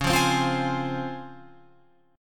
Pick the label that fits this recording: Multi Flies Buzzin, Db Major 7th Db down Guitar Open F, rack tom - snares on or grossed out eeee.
Db Major 7th Db down Guitar Open F